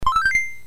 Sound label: BASIC sid MOS6581R4, GAMES; Sword of Fargoal